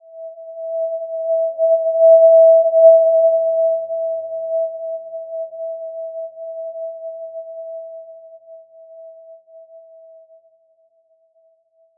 Simple Glow E5, healing soundscapes

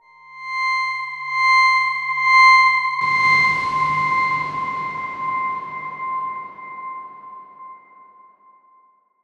Darkswarm C1 pp; HSS Ableton Pack1

